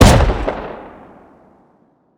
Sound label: shotgun trap fire; Rust